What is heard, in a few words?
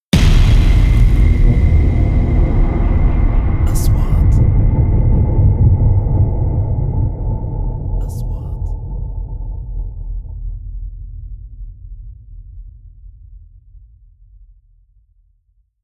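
3D SFX Trailer; مؤثرات تشويقية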